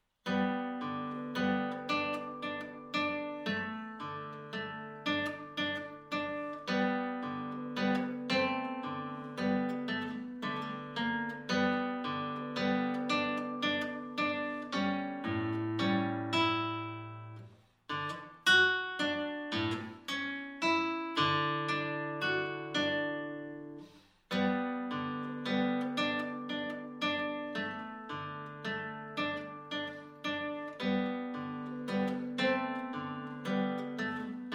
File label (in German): Deutsche Tanz solo